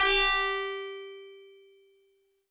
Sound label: Tubular Bells DX100 G4